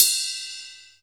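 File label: Ride, Percussion Hits; Zero-G Jungle Warfare 1, 2 & 3; 90 s Sample CDs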